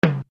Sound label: TM1; Casio RZ1